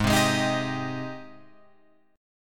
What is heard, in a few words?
Ab Major 7th Ab down Guitar Open F; AbM7 strummed